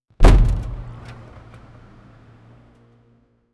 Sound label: car hits truck; collision